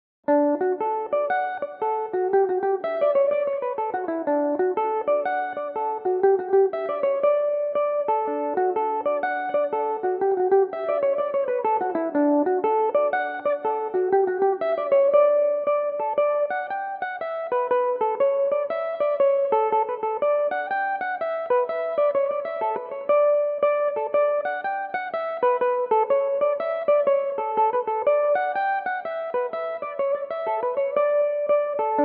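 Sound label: D major, Irish mandolin; rosie finns favourite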